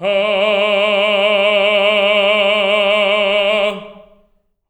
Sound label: TENOR G#3, Voices; Spectrasonics; Symphony of Voices